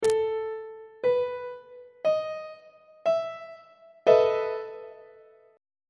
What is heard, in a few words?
e major 7th suspended 4th 1st inversion on treble clef